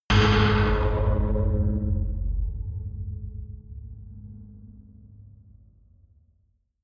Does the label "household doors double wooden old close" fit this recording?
No